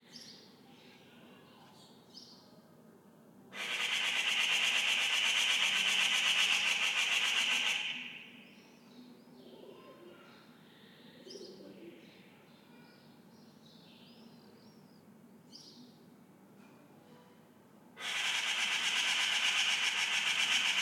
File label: RESIDENTIAL Morning, Birds, Magpie, Pigeon, Voices, Human Activities, Distant Traffic